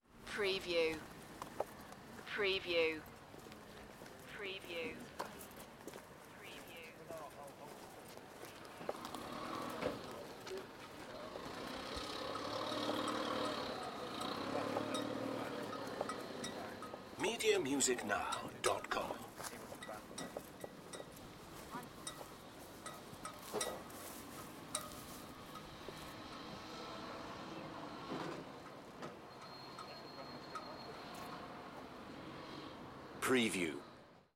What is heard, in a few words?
Estuary hustle and bustle; Borth